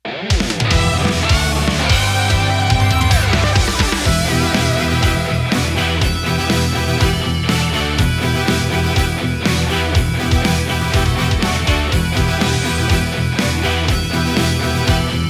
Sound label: VICTORY COMPLETE; Star Vikings Forever